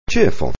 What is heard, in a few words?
cheer